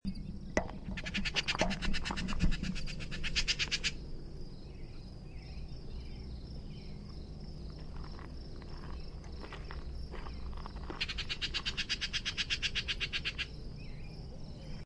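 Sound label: Bullock's Oriole chatter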